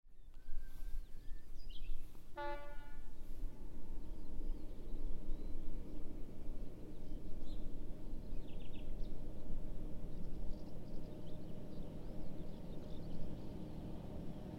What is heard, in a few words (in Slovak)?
Čečejovce prejazd vlaku